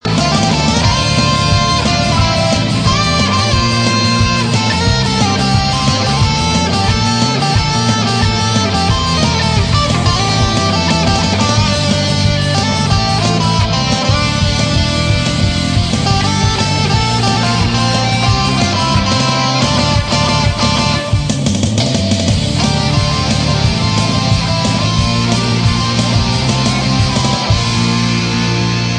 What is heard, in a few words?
guitar cover